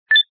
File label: ringtone